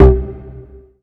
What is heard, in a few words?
butt click wrong; theodyssey